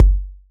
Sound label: kicks; Gadget; RoBKTA Sample Pack Supreme